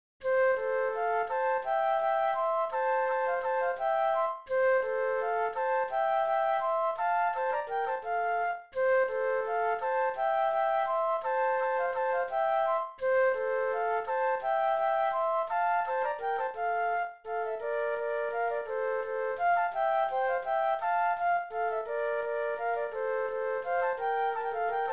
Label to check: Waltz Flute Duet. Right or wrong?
Right